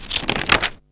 Page Turn; NightFall

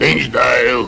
din

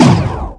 laser; nihilist

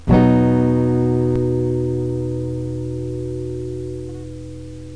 noise

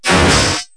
Energy Vaporizer, Cartoon, Space Zaps; KABOOM! - Wild and Wacky Sound Software

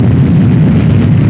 gquak; Bzone

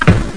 safe door close

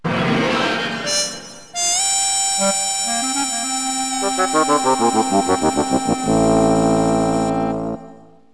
sax blues; music; Wired for Sound Pro CD; Aristosoft, Inc.

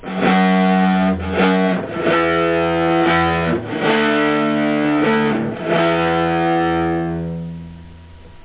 Rev Reverb, Guitar; Guitar 1.01

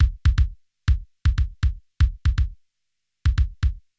DRUMS, 120 BPM

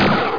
shot; SpaceInvasion

